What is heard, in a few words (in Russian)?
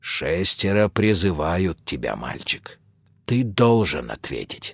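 garmon, accordion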